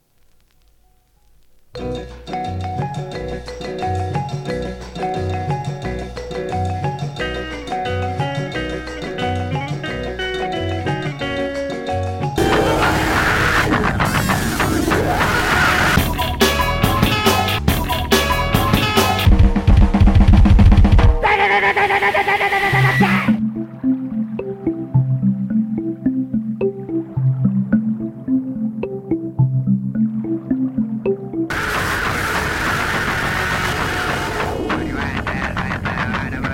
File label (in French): musique; musique pas rap